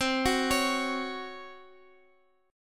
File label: C sharp Major 7th Suspended 4th C